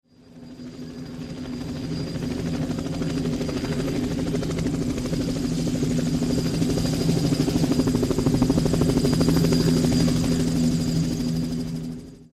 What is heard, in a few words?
Army Helicopter